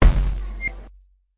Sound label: Kick Modern, DRUMS, REAL LIVE KITS; Osama Qureshi DrumKit